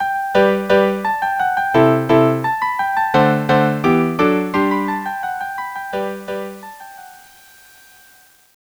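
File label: Allegro for mechanical organ string quartet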